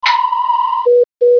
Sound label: Key Hail